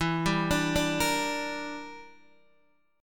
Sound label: E Minor 7th Flat 5th E, Guitar Open D